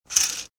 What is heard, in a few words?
Thin fabric curtain slide swish; blinds